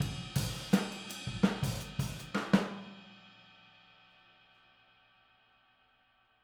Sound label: Boogaloo Outro Overhead, 82 BPM, drums